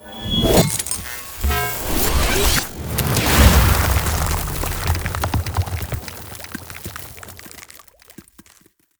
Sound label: Ice Spell Damage Direct Frostbite Beam; Arctic Aura